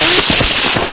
grind flesh, manhack; hl2

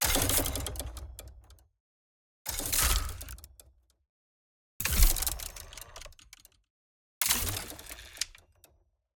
Ricochet Hits, Steampunk Gadgets; Justsoundeffects

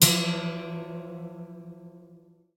key hit single bulbul; 3maze; IMPACTUS

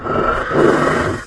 cremator alert object; hl2

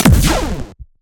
mech weapon scifi shot; BluezoneCorp; Annihilation - Mech

